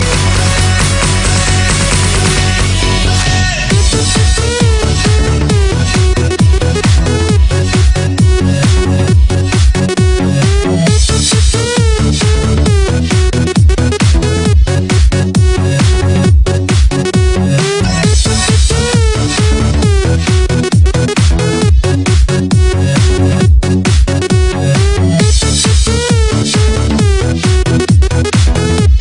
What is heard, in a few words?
Electro